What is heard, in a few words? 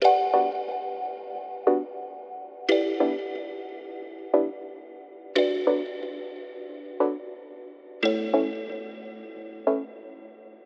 Pluck B Minor 90bpm